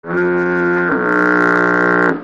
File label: fog horn